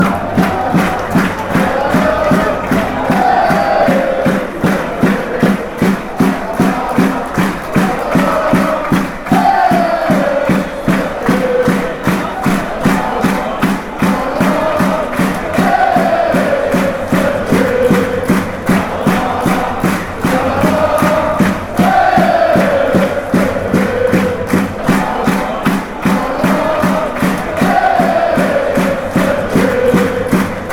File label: club brugge chant